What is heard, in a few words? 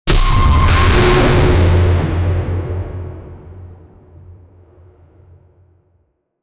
a huge steel mechanical, charging